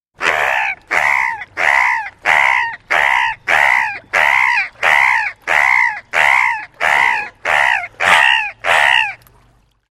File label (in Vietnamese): chú cá heo nhỏ thật thật thương